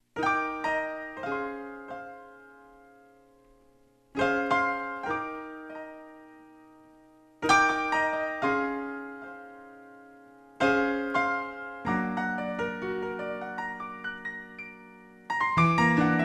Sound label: jazz